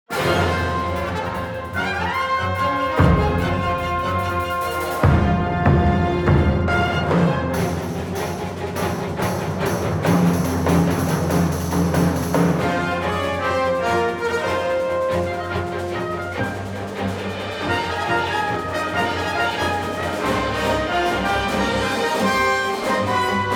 Fanfare

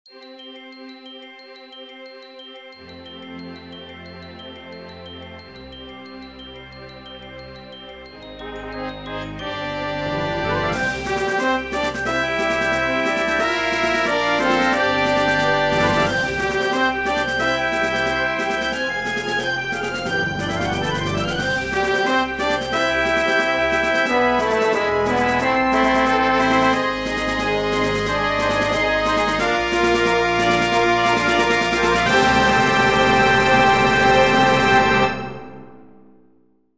Fanfaronade

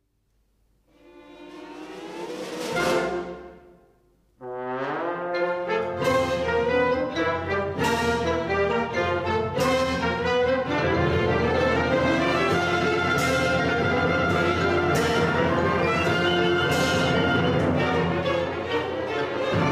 Funfair Fanfare

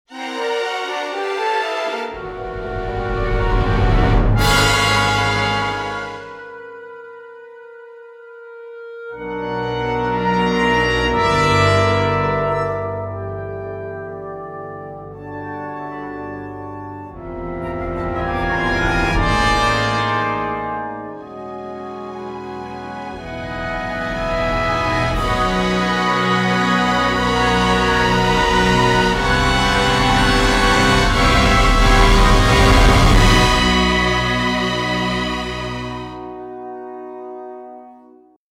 fanfare

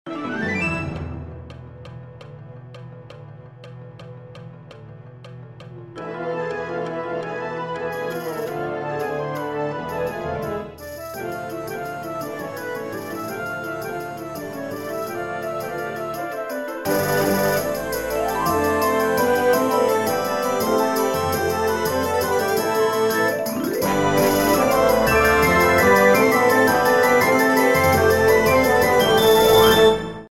Oriental Overture

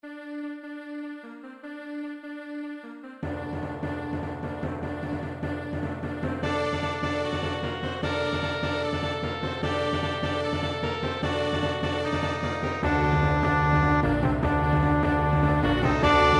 Fanfare

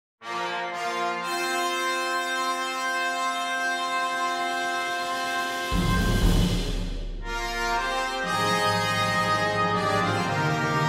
Fanfare

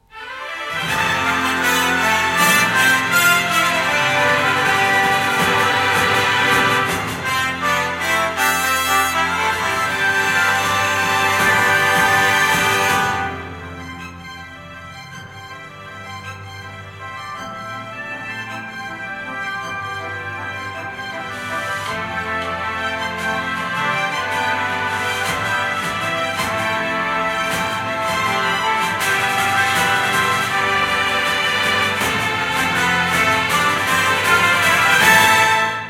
FANFARE

